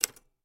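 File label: Typewriter Single Key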